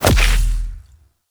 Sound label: Kick; Warframe; Pacifist